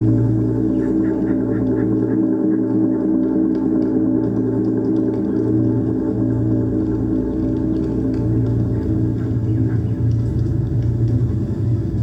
Alien amb

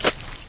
player slosh

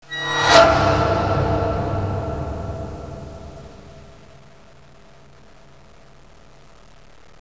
scary

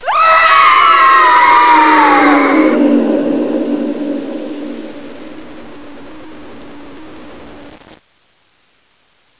scream

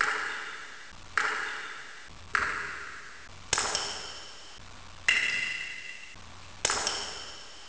drips

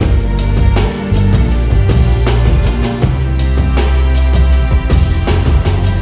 keygen song

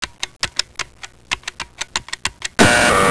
buttons

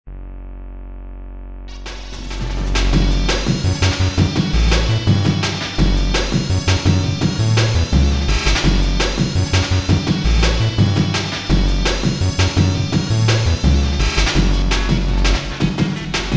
keygen song

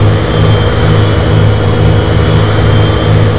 rotor

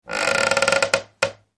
wood squeak

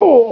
pain, cleansuit player

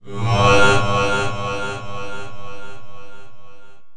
sick

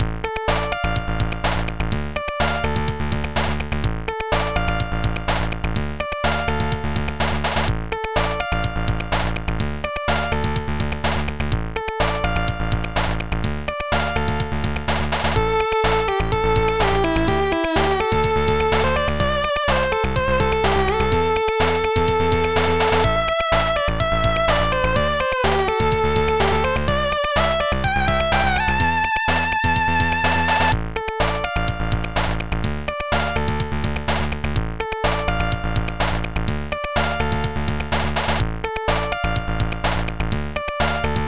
keygen song

